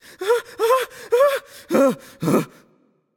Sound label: fear; voicepack; smite; merlin